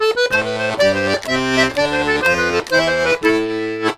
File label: RONDO, Accordions; USB Soundscan vol.40; AKAI